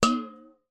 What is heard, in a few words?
impact metal bowl water wobble